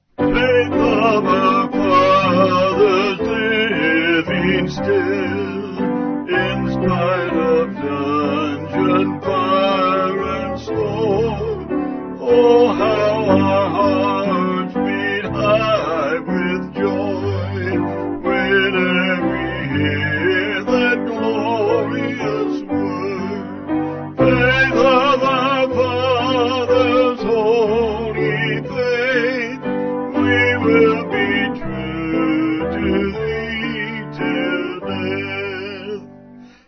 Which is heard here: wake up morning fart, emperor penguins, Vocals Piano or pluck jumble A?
Vocals Piano